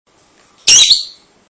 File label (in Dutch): contact, agaporniden; v 1.5 jr